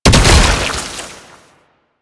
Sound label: Proto Infested Brachiolyst Projectile Explosion; Warframe; Abilities